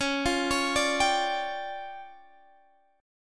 D Minor Major 7th Double Flat 5th, Db

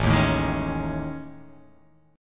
A Major 7th Flat 5th, down

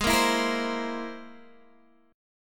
C Augmented 7th G sharp

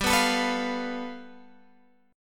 G 9th, Guitar Open G

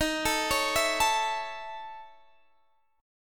A Major D sharp; arpeggiated; JGuitar

